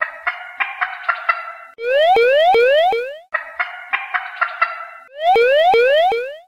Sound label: Wake Up Sound